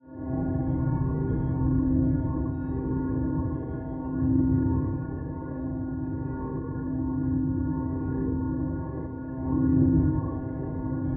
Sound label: Key